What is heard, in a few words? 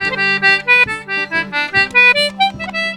Celtic Flavours; USB Soundscan vol.42; AKAI